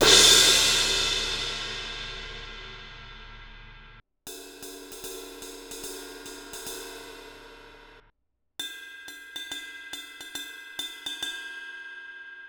18 inch jazz medium thin crash crash ride bell, cymbal; Meinl; byzance